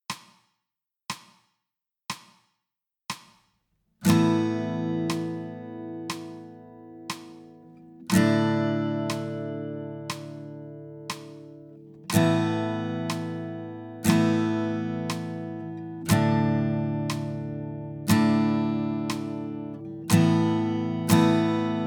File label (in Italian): Chitarra primi tasti melodia